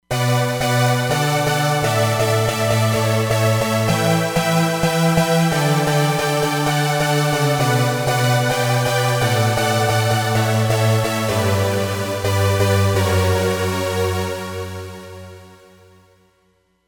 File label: Saw Stack, Roland Fantom XR